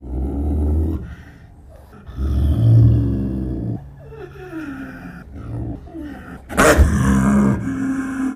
Leopard Snow Growl; big cats

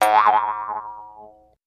Jews Harp Vibrato, boings

